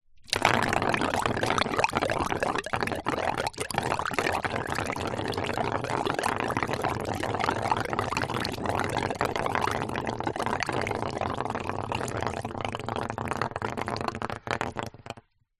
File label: foley air blow through small pipe contaning little water bubbles gurgle